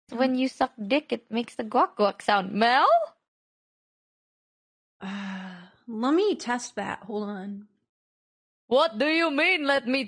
uuh

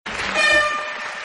small horn

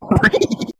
close laugh; scooby

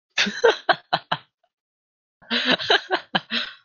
laughing

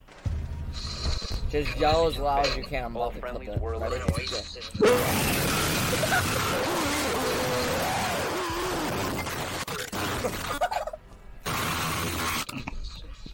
loud noise

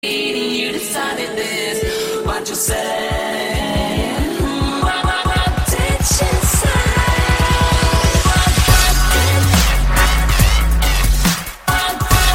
dubstep remix

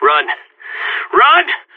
bomb exploding

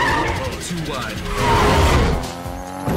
bridge jump; 2 fast 2 furious

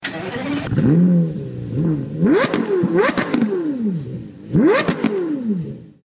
porsche carrera

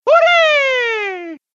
spin jump

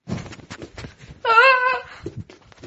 haaaaa

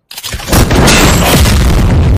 ignition burst; genos

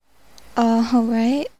giggle alright

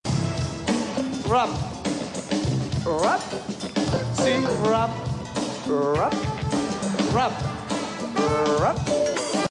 rap

